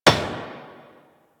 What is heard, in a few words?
Debris Metal Stone; Warframe; Ambience; Entrati